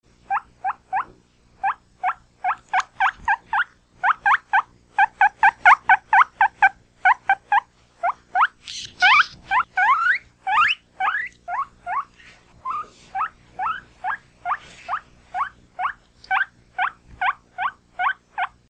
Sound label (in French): cochon d Inde cri